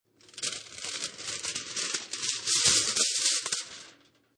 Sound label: Metal Foil Aluminium Foil Crumble; Crunches